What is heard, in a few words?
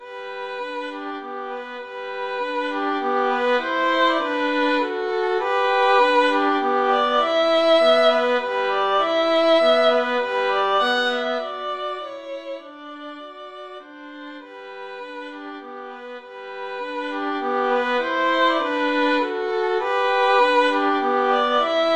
waltz violin duet